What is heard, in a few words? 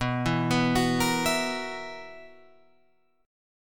B Major 7th Suspended 4th, Guitar Open F chord; arpeggiated; 11,11,11,13,13,11; JGuitar